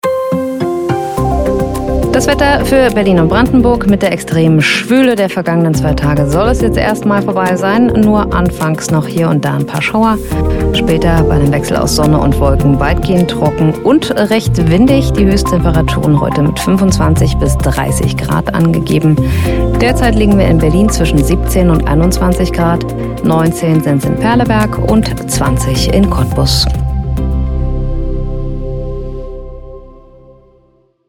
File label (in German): Wetter